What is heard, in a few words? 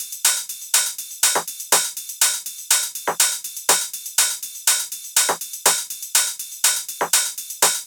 Deep House Kit 122bpm Top, Drums; EDM Drums; Drum Kits; Stems; Ghosthack; Ultimate EDM Drums; Deep House Kit 10